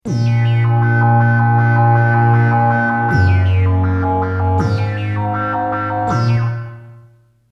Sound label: Oberheim OBX Seq